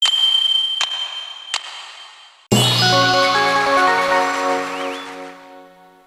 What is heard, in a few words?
puzzle win shuffle